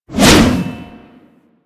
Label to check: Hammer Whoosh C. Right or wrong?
Right